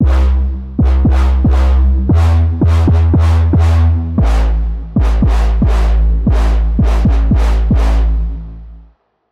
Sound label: Brassy Charge, bass synth; Preset: Brassy Charge; Wave Alchemy - Bassynth 1.1.0